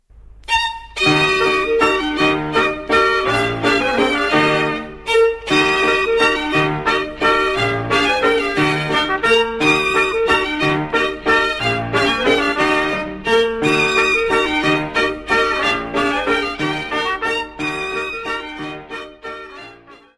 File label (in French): Valse - Belgique; Valse de Heyd